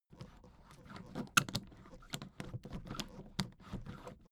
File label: suitcase handle handling